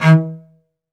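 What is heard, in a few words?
solo cello, strings; ILIO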